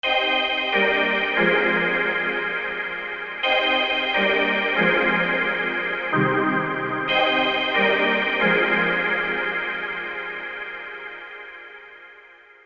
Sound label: Juno X X Synth